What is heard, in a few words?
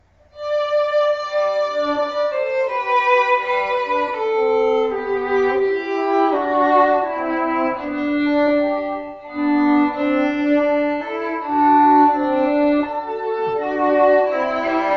duet